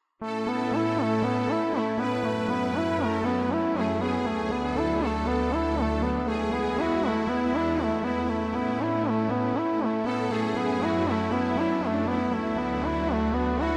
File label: Korg PA800; demo song